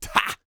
YELL, MALE HUMAN; Spectrasonics Vocal Planet

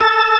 ORGAN ALL STOPS; Keith Emerson Signature Series

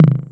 Tom Delay, TR-606 Bent Samples; Sonic Foundry (Sony Creative Software); The Electronic Music Manuscript Collection; Battery 3 Sample Kits

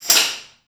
trash stix; Spectrasonics; Liquid Grooves 1 & 2; METALS

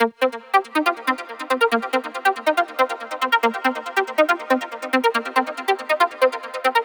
140BPM C; Vengeance - Essential Clubsounds Vol.4

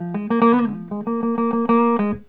GUITAR LICK; Ueberschall; Jam Box

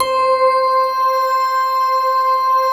VFX BELL, Synthesizers

